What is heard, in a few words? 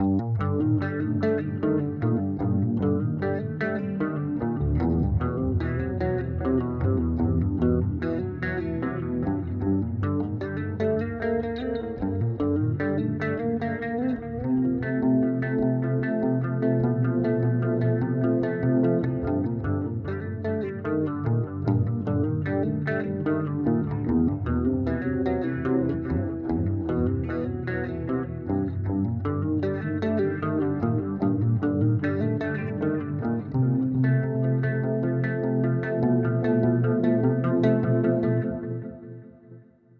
Jazz Chorus; Boss GT-1000 Core